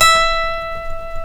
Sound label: F#, funk guitars; Spectrasonics; Hans Zimmer Guitars VOL-1; TAKA FUNK